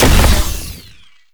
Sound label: Flying Mouth Proj Hit; Warframe; Man In The Wall